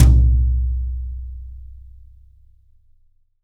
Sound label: YAMAHA, drums; Big Fish Audio; Ross Garfield Drums VOL-2; T-YAM BRUSH